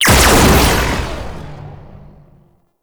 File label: Shot, Weapons; Warframe; Corpus; Tenet Quanta